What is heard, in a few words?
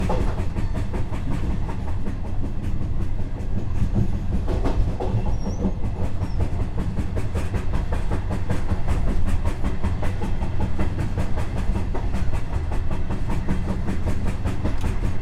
Train